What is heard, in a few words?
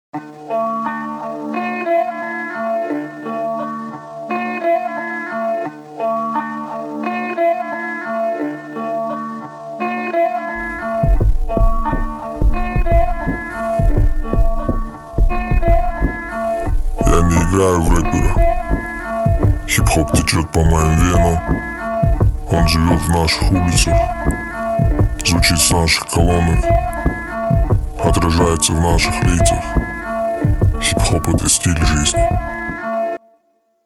hip hop